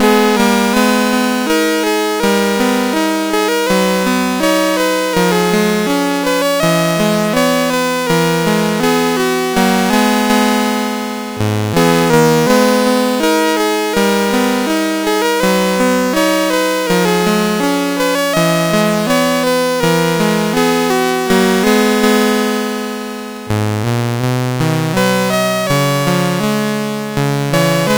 sid CSG8580R5

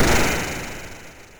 sid MOS6581R2

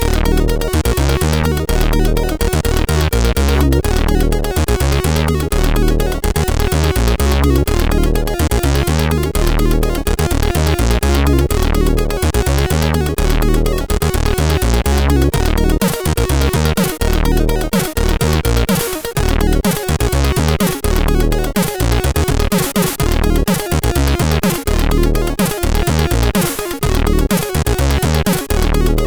sid CSG8580R5

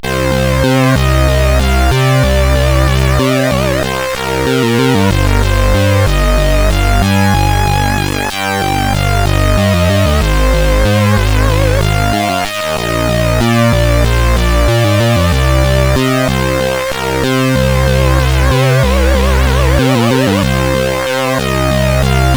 sid CSG8580R5

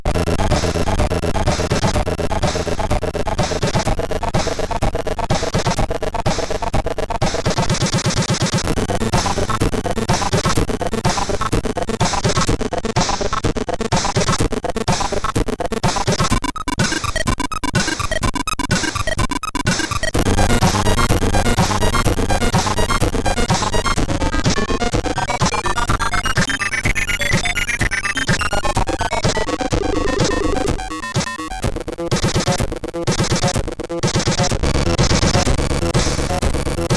Lobster, sid CSG8580R5

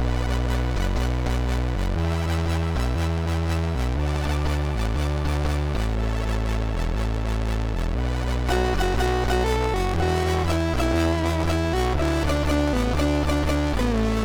Level completed, MOS6581R3; sid

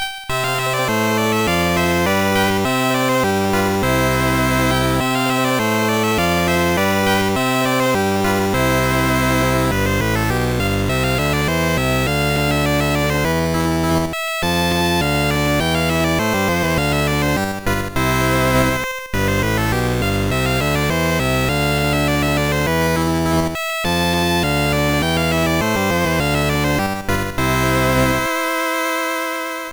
classic concert; InStereo 2.0

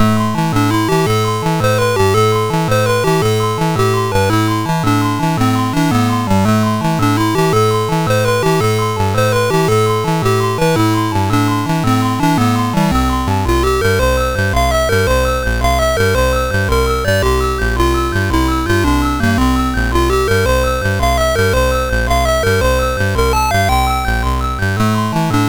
sid MOS6581R2; Airwolf; HVSC

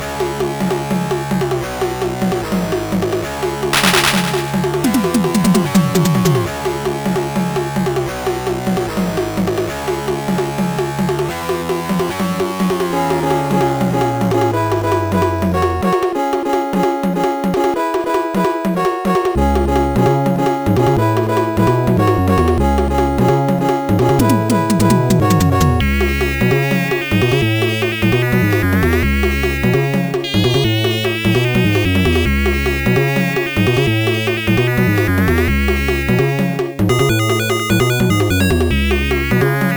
guitar; InStereo 2.0

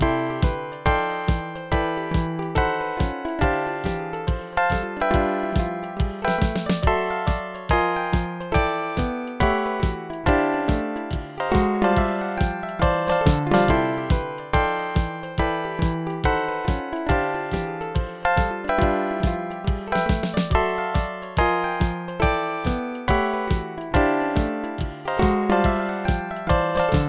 solo; Dr. T's MIDI Recording Studio

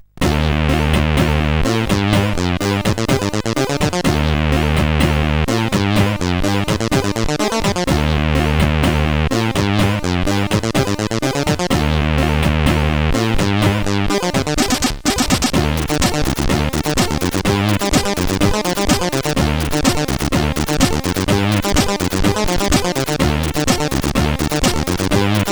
sid CSG8580R5; Now This Tune Sounds Faintly Familiar

